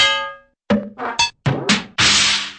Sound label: clank bonks and crash